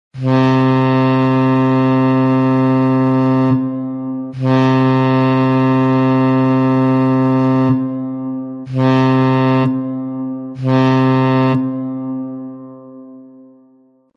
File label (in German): Schallsignal Binnen Ueberholen Backbord